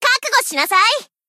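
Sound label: attack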